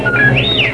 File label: r2 whistle